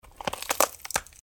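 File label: fracture with a twist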